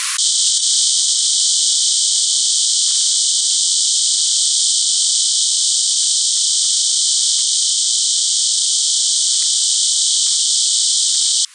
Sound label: qam-64 tandeme modem